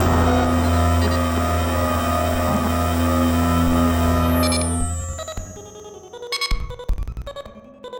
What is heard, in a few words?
Battered Synth; malfunctioning synth samples